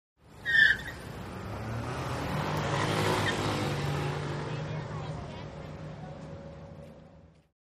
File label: Car Short Screech By A